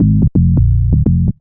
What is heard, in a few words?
NRG Bass, Trance